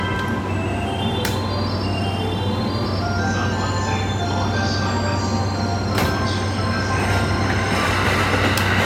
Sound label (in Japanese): 京浜東北線新橋南, train sounds